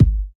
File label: clean kick drum g key